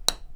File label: hit, minigolf